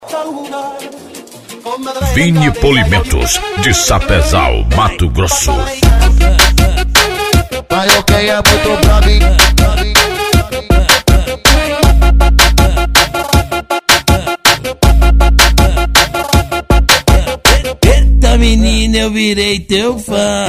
funk e rap

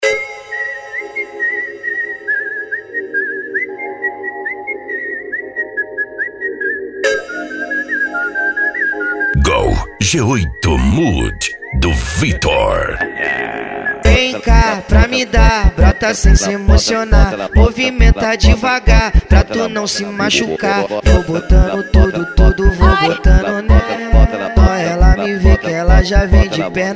funk ritmado

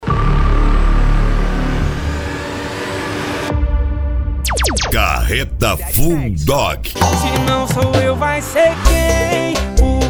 sertanejo

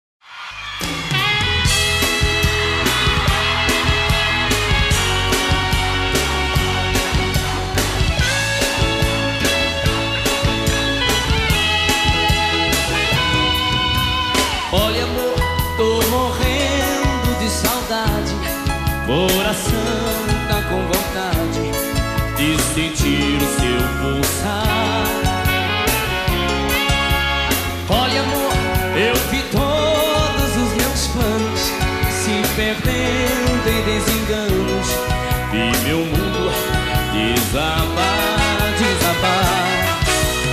sertanejo classico

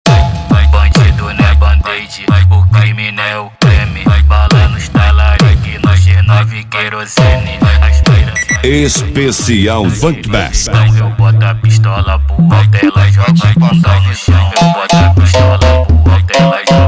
especial funk bass

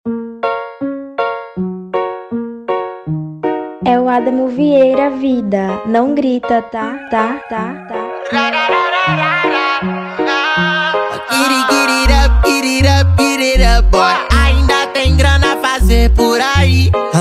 baile funk

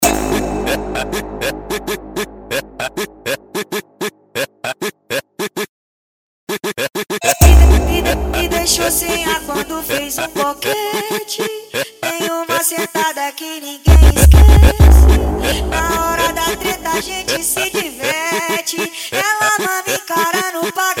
esp funk mega bass

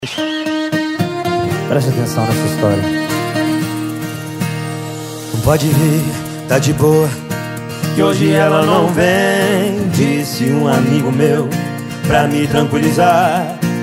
sertanejo e pisadinha; cd volks sound car

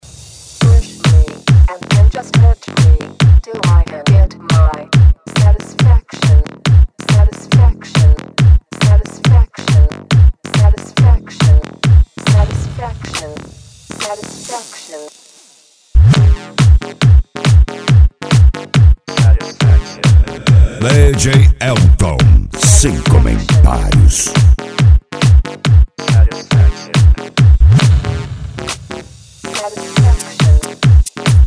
eletro car automotivo

